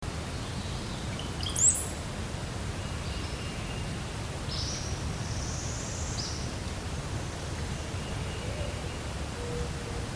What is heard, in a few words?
Brown-headed Cowbird